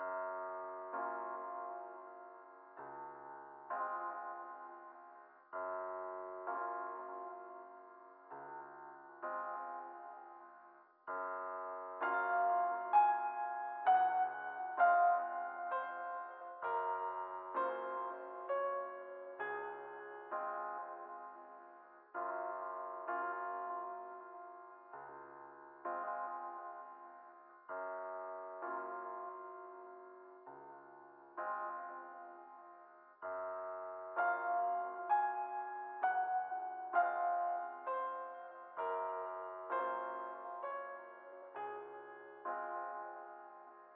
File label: simple gymnopaede piano loop; 0°N 0°W